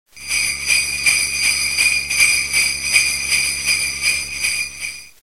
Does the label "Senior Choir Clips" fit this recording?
No